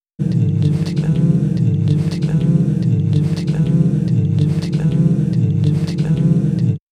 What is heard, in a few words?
loop; puredata; ALFALOOP